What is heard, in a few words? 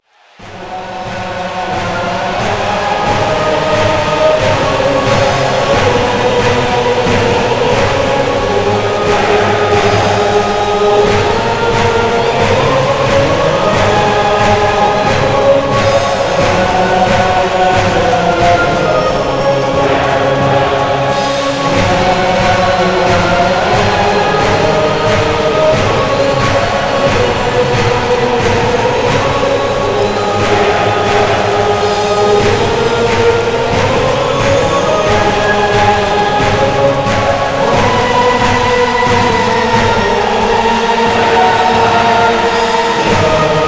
Chants, Land Of Hope And Glory